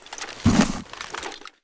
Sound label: mask use; STALKER; IWP; item usage